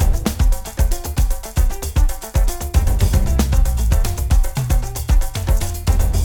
music free spin intro loop

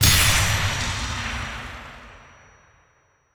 big Win Text Change; netent

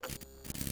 win display

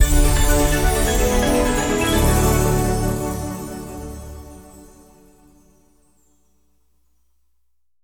intro Free Spin; netent

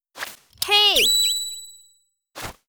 blue Bird Win Arms; netent

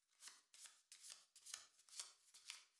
beat cards; netent

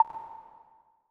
count up end; netent

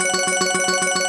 Big Win Countup

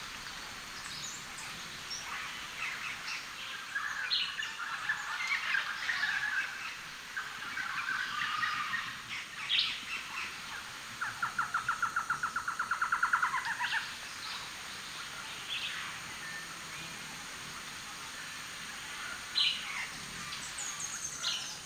Jungle SFX loop; netent

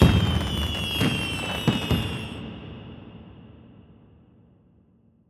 big Win Fireworks End; netent

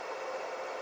Reel Spin; netent

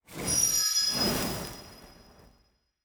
frame appear; netent

